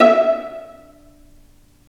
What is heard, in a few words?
Vas Pizz; STR Vlas Pizz